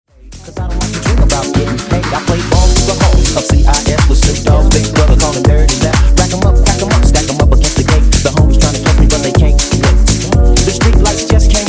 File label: Funk Remix 123Bpm